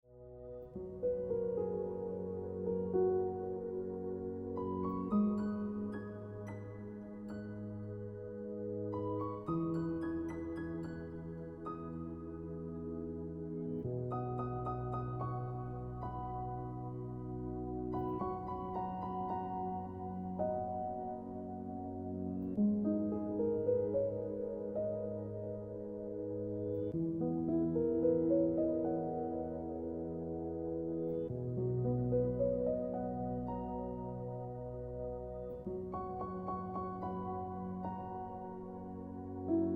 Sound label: Piano Music